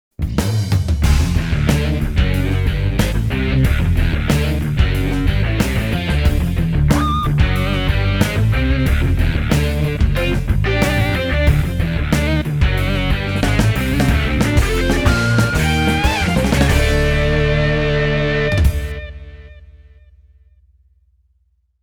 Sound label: Distortion Guitar